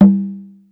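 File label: Conga Low Open, Yamaha DD-35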